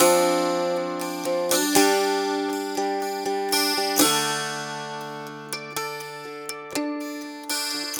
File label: Dulcimer